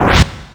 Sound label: Flame Choke Wind Up; Ganondorf's Flame Choke winding up then rushing